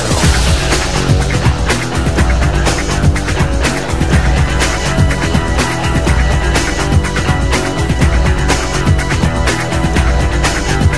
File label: techno; Goldeneye Mod Site; JKDF2